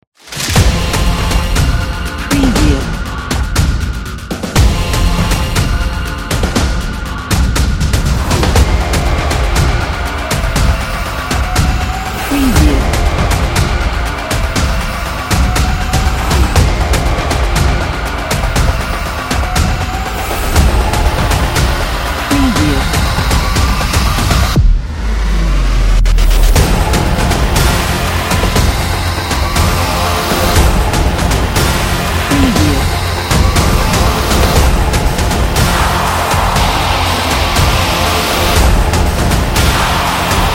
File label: blockbuster action trailer